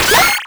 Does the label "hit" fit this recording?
Yes